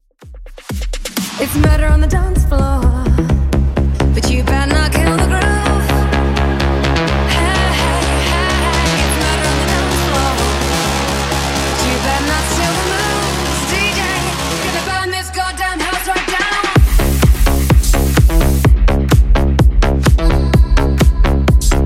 Bootleg House Redrum 127 bpm

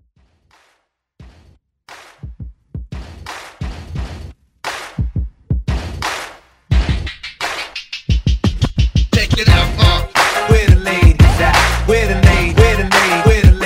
Throwback Redrum Hype

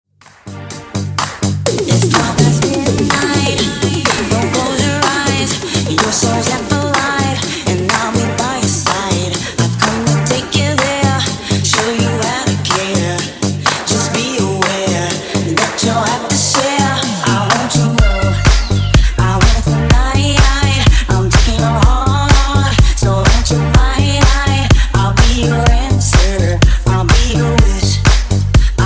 Funk Redrum 125Bpm

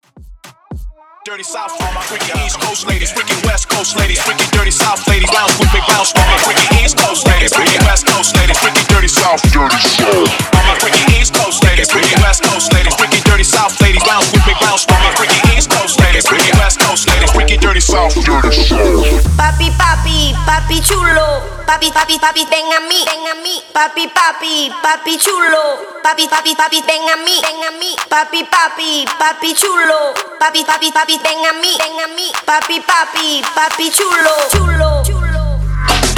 Aca Out Clap Reggaeton 110 BPM

